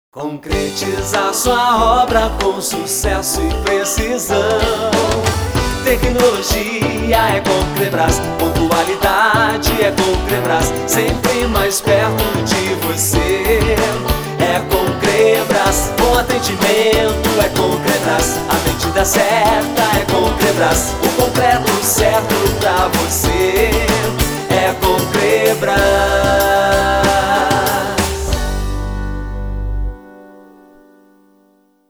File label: Jingle